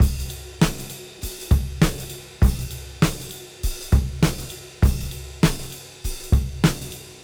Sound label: Cym Beat 99 BPM